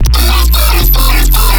Sound FX Dub

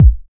Kick

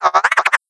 Sound FX Shaky E Scratch

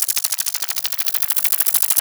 Rotor Flap; Hi Tech

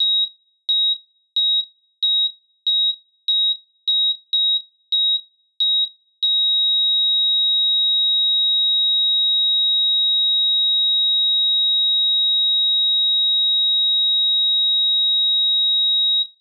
SoundFX Flatline

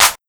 Clap

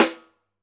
jungle snare

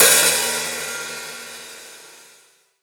Hi Hat Open; Lightning1024

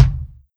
Kick

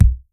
kick drum

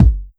Kick Dubstep

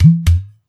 Perc Udu Low; Framedusa

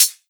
HiHat Closed; Techgeekmusic

